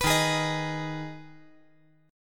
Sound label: D sharp Suspended 4th Sharp 5th